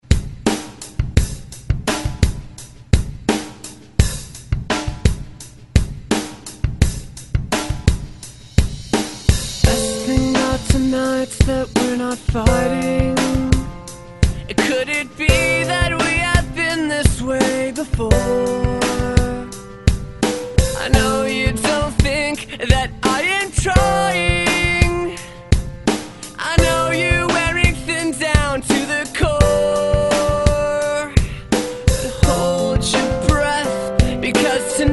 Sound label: Alternative Rock 85Bpm; DJDOLLS